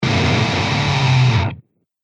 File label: Scream Total Distortion; Screaming Sound Effects